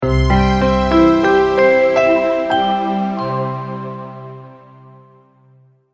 multimedia logo, Musical Identity/Signature; Short Musical Signature / Ident; Sonic Logo; Sound Design; McKinney Sound

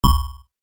Sc-Fi Generic Game SFX; Tech; Stuart Duffield

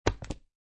Cards Playing Cards Dealing On Felt; Playing cards, poker; Dealer taking cards from hand and putting on felt; Lynne Music

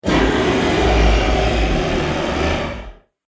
Dinosaur Epic Lizard Monster

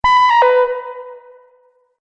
Multimedia Event Notification, beep sound effect

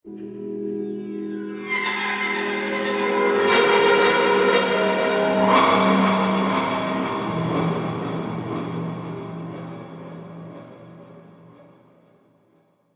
Horror Film Ambience; Scary Soundscape Sound Effects

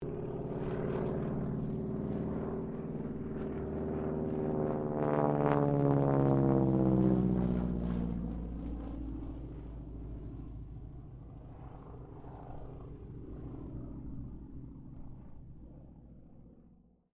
AIRCRAFT AT-6D HARVARD MK III PASS; Temora Harvard MK III; Airplanes; Vehicles

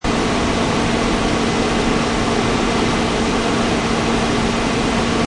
Truck Chevy Loop Rpm 4000 Engine Perspective; Car

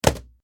Cardboard Box Dropped Foley Small Package; Miscellaneous Impact

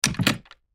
Household Cabinet Door Metal Latch Close; Cabinet Doors; Blastwave

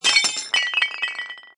Cartoon Short Crash, Ceramic or thick glass fall; Comedy/Cartoon; Ceramic or thick glass fall and rattle; Funny Sounds; Lynne Music

